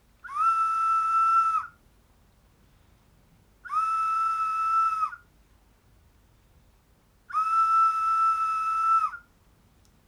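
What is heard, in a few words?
3 whistle in cell A rec courtyard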